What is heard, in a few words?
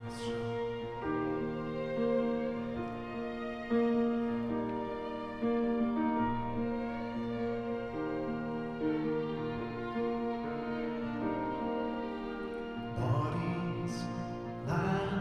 Orchestral excerpt